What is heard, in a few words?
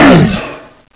zombie hurt; aksha zombie